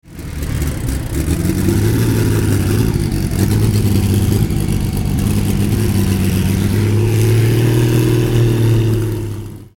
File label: Porsche 935, engine